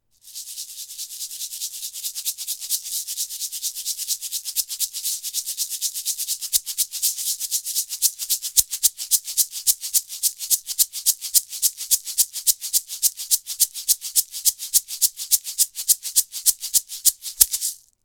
maraca gross

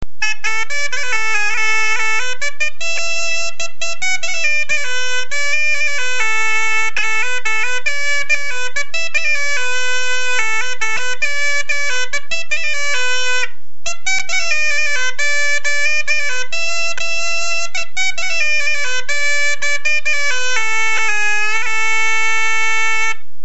bombarde

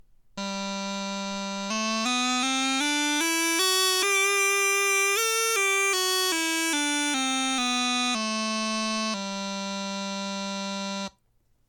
chanter tonleiter

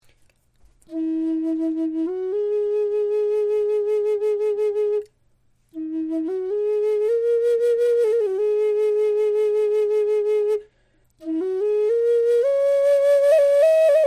indianerfloete tief

